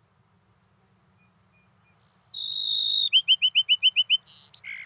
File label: black throated blue warbler